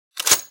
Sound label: mosin pull, guns